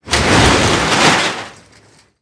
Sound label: metal construction; World of Tanks Blitz